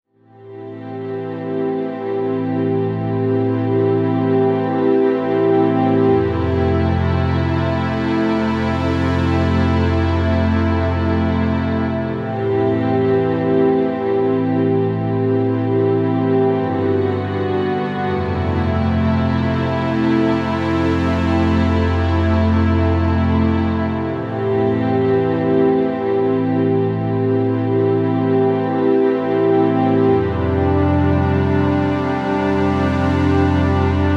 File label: Nomad Ambient